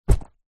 footstep single shoe business leather buckle zipper womans on carpet; zapsplat